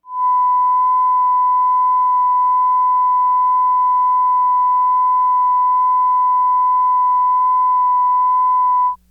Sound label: Opt Mag 1Khz